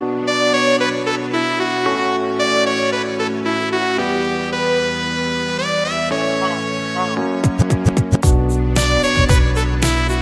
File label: awesome saxophone ringtone